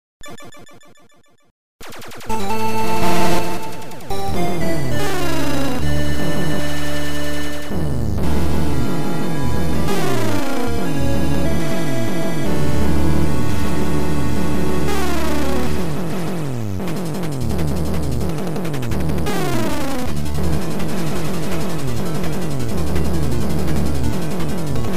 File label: ship